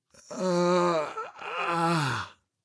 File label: moan, male; npc; Garry's Mod; hl2